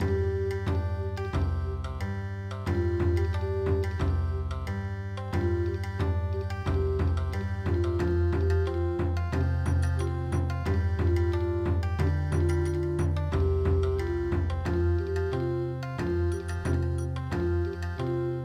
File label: Bon Odori